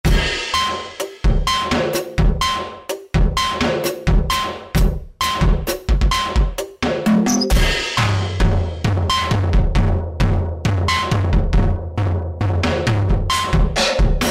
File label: Industro; Factory Preset examples